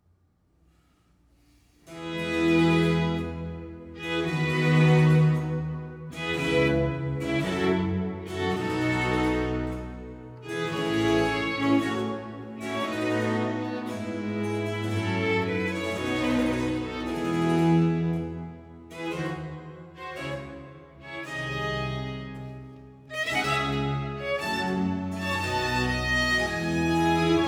Concerto Grosso in F major; Corelli

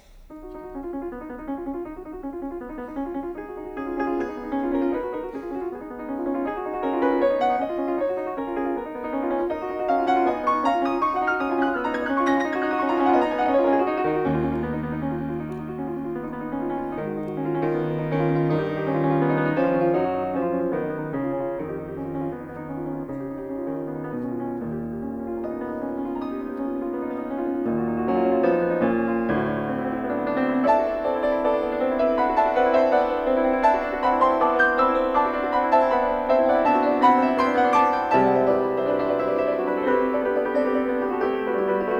suite for piano

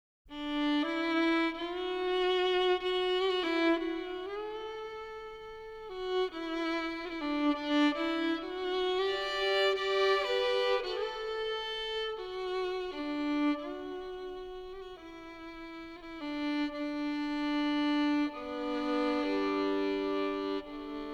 Solo Violin; Opening; Songs of Stephen Foster

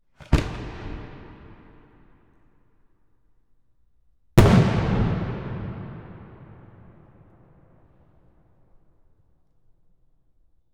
Aerial Explosion Right; Telarc Sampler, Vol 5

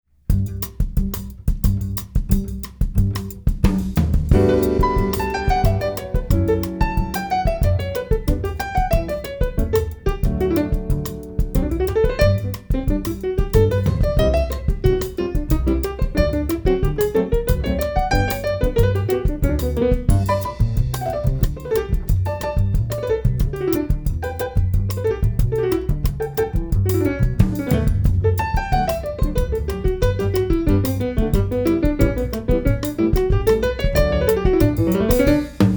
Variations, for keyboard

